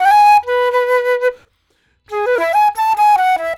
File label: Chill Pop Flute, E; Pop Rock